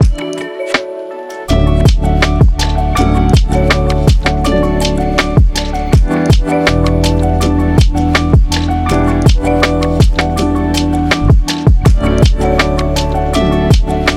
relaxation study tape

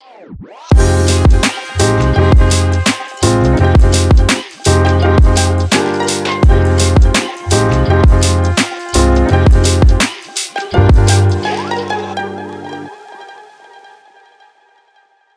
mellow travel vlog

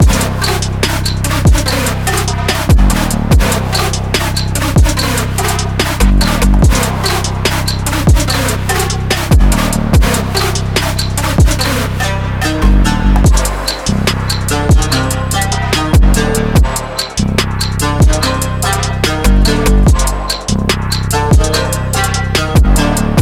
brutal sports beats loop

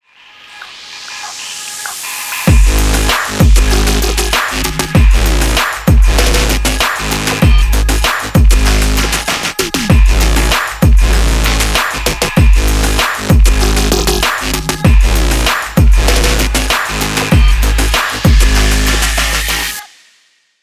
hi tech presentation

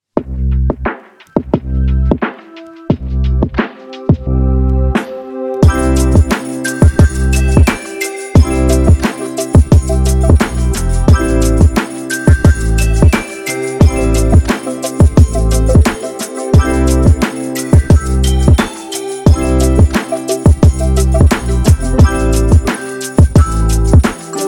christmas hip hop beat

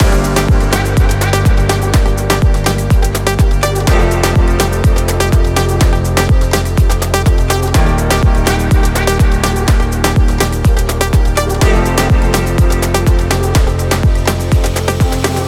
adventure trip loop

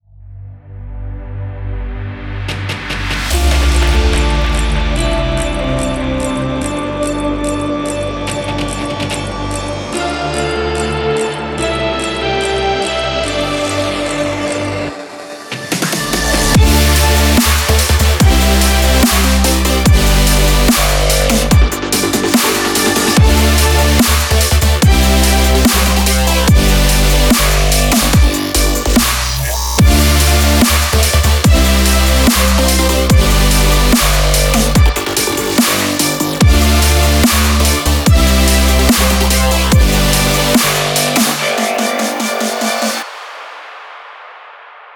extreme sports dubstep

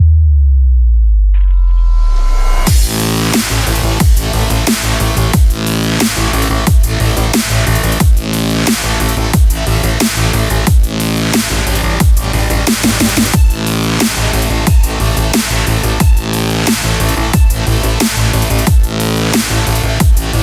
extreme action sport

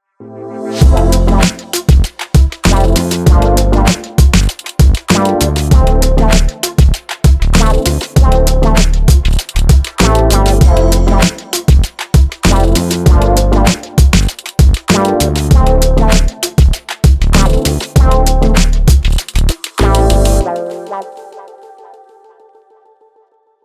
bright fashion background

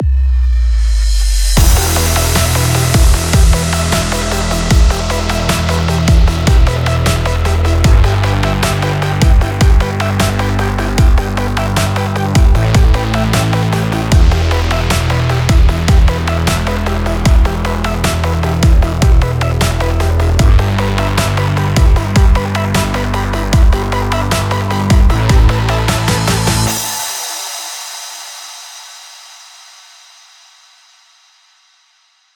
aggressive hi tech and action sport dubstep

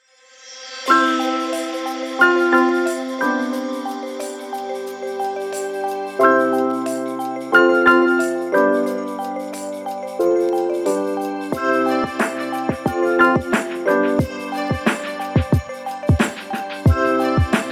beautiful christmas

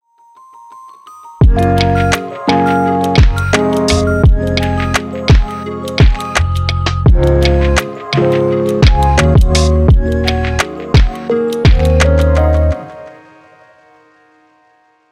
chill city vibes

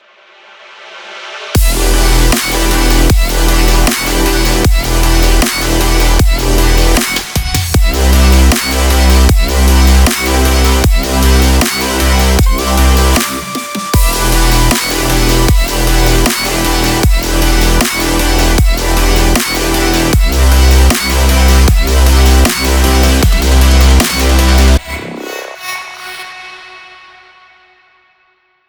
epic emotional melodic dubstep

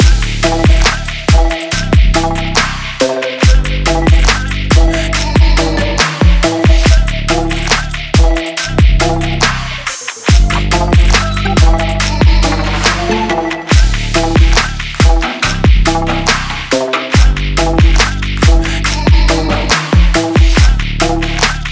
stylish background

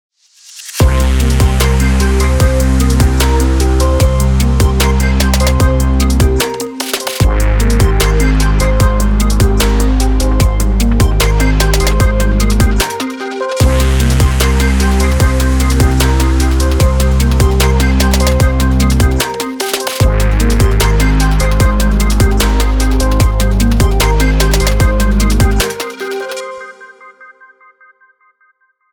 adrenaline rush dubstep

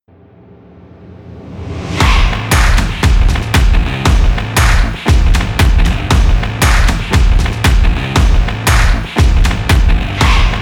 stomping extreme sport

